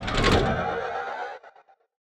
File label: bot lift arm; mine; battle